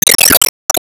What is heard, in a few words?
Enemy Waterplant Tentacle Shot